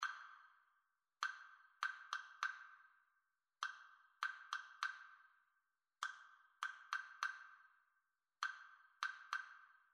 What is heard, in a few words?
woodblock; composing at home